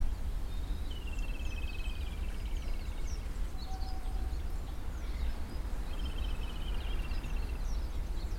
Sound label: robin rufous tailed Larvivora sibilans